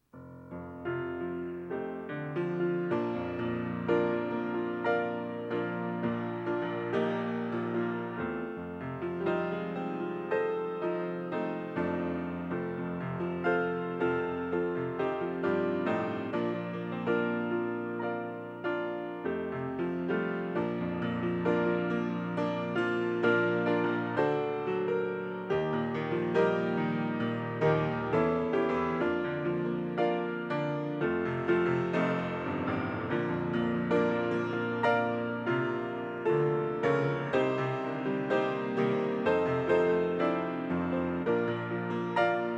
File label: piano solo